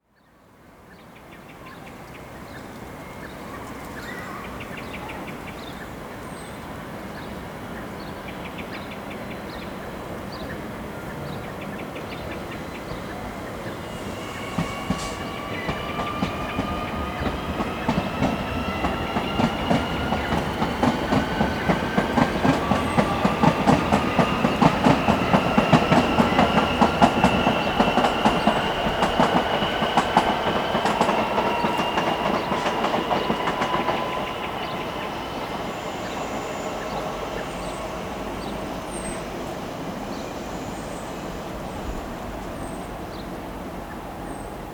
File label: Ambiance ext